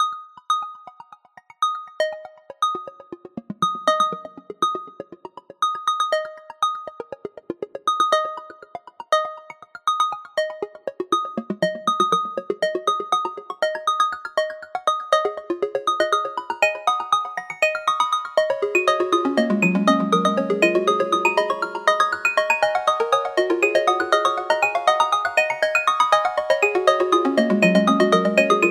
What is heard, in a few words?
alarm, ringtones; sound of oo website ringtones